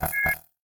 Weapon Beep, Science Fiction Sci-Fi Electronic Beeps